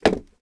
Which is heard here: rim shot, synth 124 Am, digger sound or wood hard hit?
wood hard hit